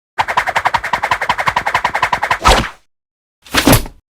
umb open; betty

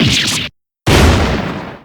damage; filch

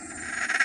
spooky

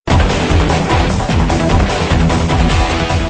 guitar; dmc-dante

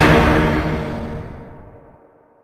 shock

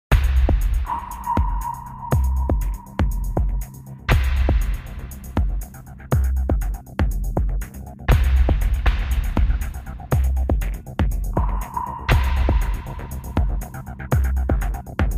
Tension

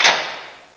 tap

